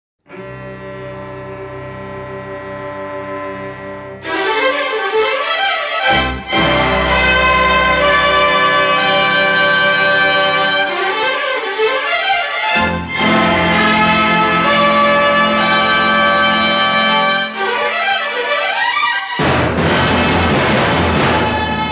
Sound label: Background Score; Vijeta